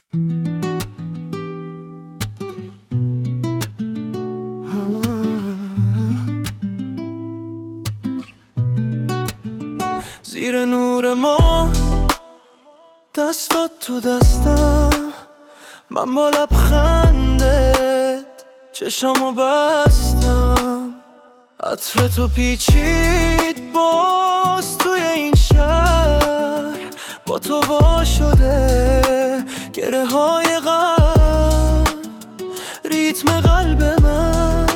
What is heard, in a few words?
Smooth electric piano chords and a groo